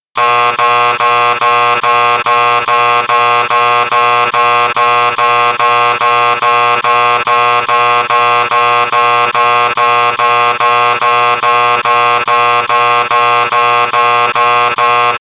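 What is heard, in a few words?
loud alarm clock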